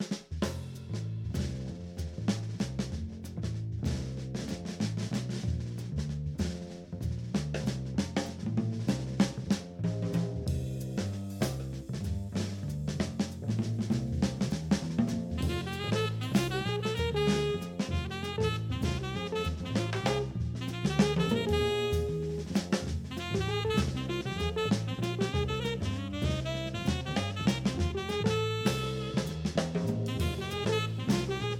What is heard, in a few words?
Jazz Quartet; jazz band